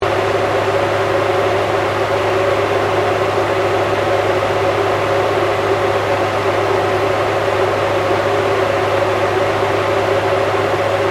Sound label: Construction Cement Pump Motor Loop, Industrial cement pump operating